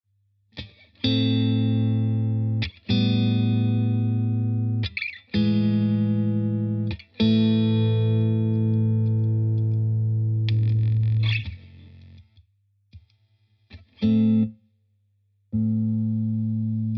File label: Crazy Tube Circuits Stoned Hz